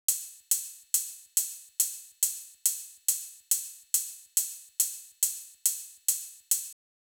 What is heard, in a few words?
drum Loop 140BPM OH; Vengeance - Essential Clubsounds Vol.5; VEC5 Drumloops; VEC5 Multi Loops 140BPM